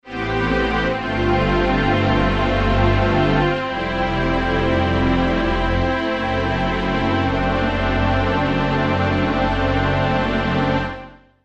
Big Strings

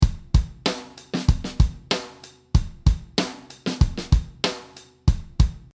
Brit Rock 95

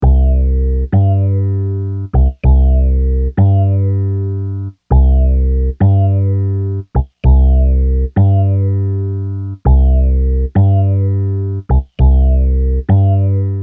Bass Balls ac bass

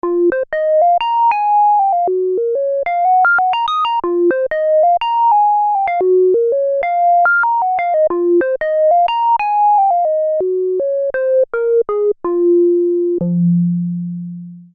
mellow hammer

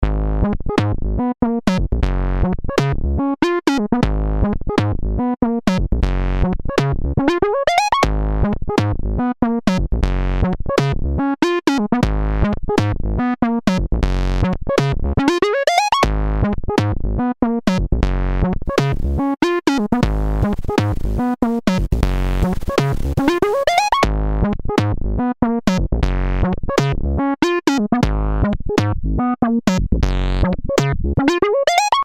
Fat TB Like; Robert Elster

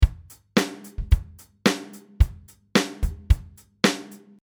Easy Pop 110

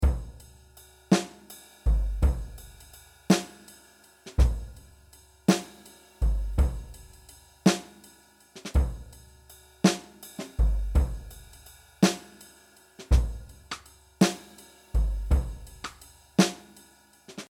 Slow Blues 55

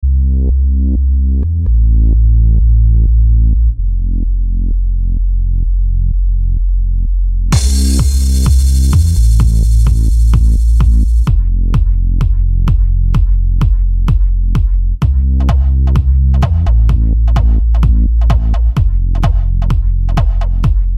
Softer

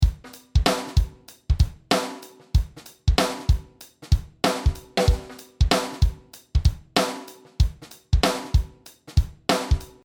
Swamp Funk 95

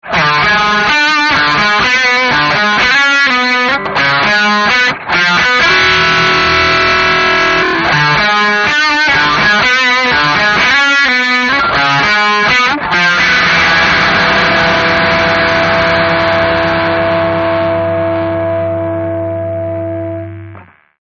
buzzsaw fuzz

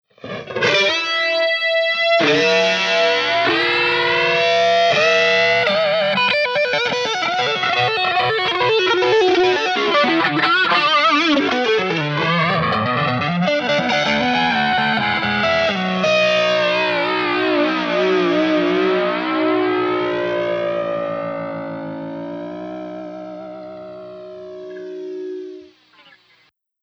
Stereo Pan; GFX CHOPPER

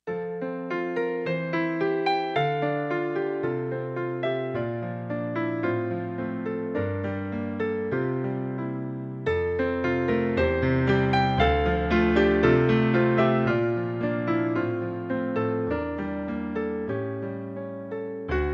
Korg Lullaby

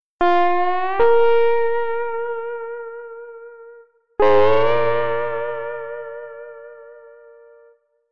pedal steel ampd

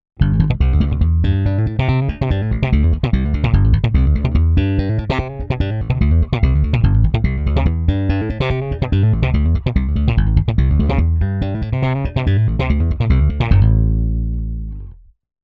Exciter; AMPWORKS

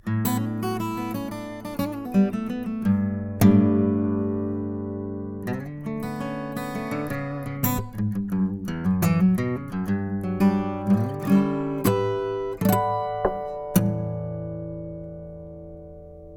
Guitar; R09HR

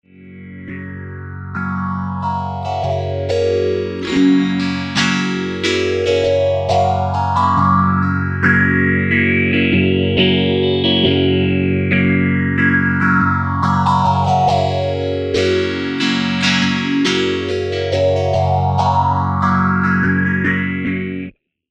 Small Stone

